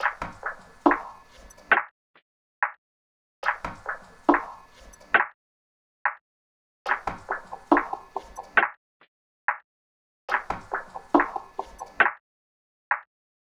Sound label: Walk Perc Loop - 140 BPM; Cymatics; Fiji Hihat Loops & Percussion